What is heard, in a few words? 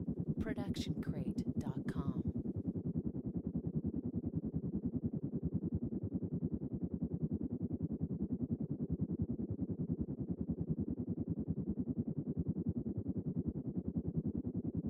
distant helicopter; SoundsCrate